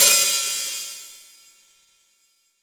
Rock Open Hi Hat, Roland BK-5

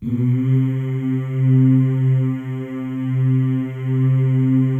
male; Spectrasonics; Symphony of Voices

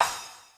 Chinese Cymbal, Yamaha DD-35

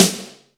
SNR SIDE; Back In Time Records - Big Bang

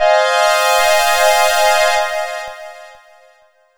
Pads, 127bpm, Nu Dance Electro; Zero-G; Soundclash; Acidized Wavs; Combi Loops; Southbeach Infusion

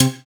Synths Root C

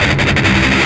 Riff, Industrial; Nasty

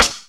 SNARE; E-MU Formula 4000 Series Vol. 1; Hip Hop Nation; Bonus Perk

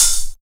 HIHAT TWO; Best Service Dream Experience

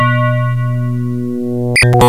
DX7 BELLS, YAMAHA FM; Rubber Chicken Software Sample Bank

